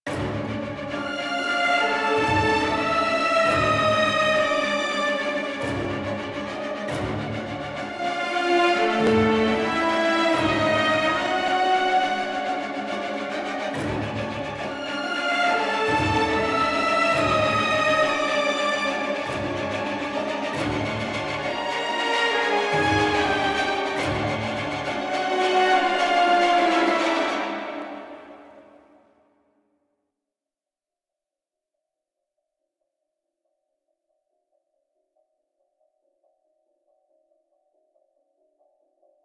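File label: Combat Melody, Music; Warframe; DE; Dynamic Music; Sacrifice One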